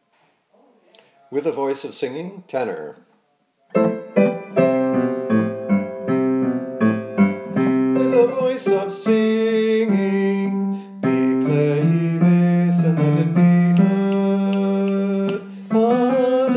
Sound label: Tenor